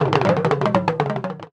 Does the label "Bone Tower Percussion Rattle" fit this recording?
Yes